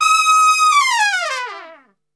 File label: D#4, Brass Super Section, TRP; Best Service; TRP LNGFLL